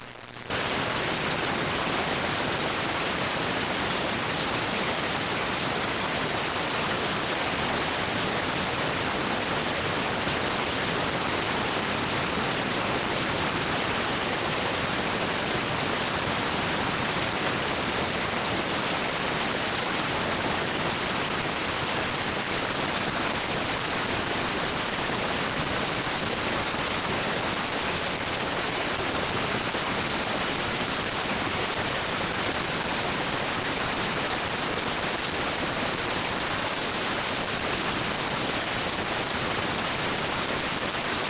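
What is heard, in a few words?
radioteletype receive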